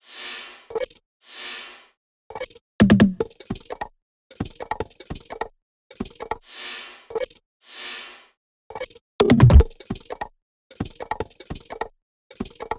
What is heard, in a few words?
loop perc 150; Seismic Loops